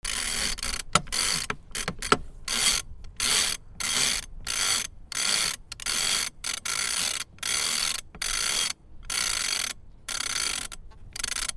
BOATS

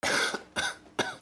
PEOPLE

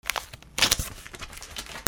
RIPPING

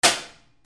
CLICKS

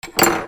KITCHEN

